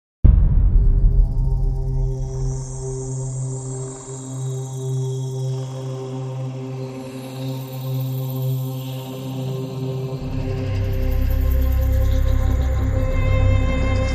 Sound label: As Above But With Violins